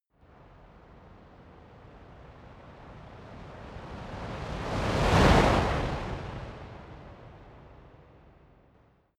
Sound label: fire torch bright slow long flutter whoosh; swooshes-swishes